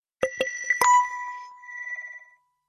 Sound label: IPhone 5 SMS, ringtone